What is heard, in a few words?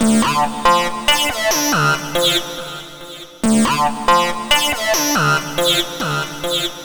HiFi, Techno Melody; TechnoMusicMaker